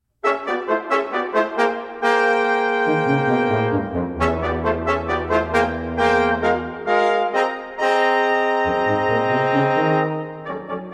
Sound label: Brass, Fanfare; Mt Olive